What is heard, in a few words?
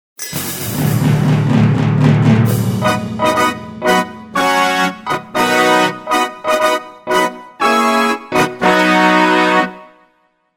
Wagner Fanfare; Light2Art